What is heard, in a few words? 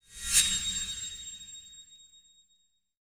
Flash Frame Lite; Title Sequence Effects; Sony Pictures Sound Effects Series